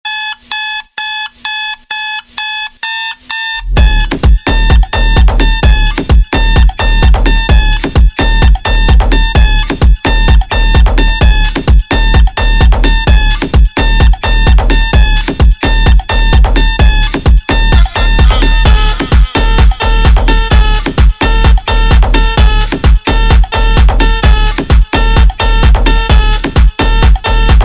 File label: Alarm Funk